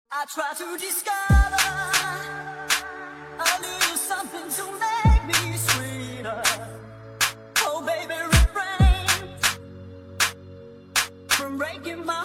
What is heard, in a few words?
funk